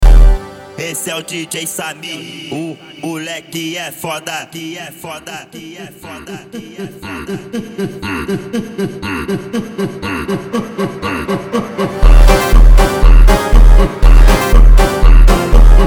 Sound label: Phonk